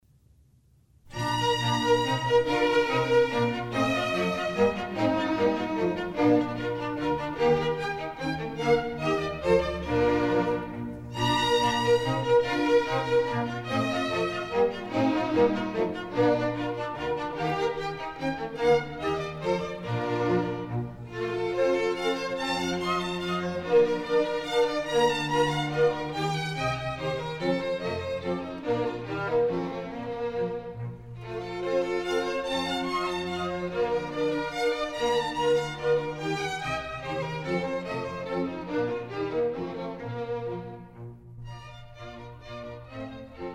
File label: Minuets, B Flat Major; Mozart; Dances and Marches